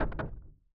locked; Five Nights at Treasure Island 2020 Remake

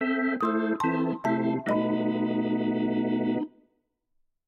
Lose; Ralph

